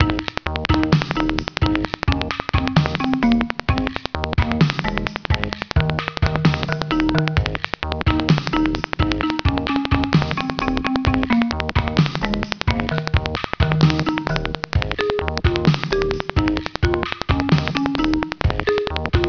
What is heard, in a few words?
Latin, Africana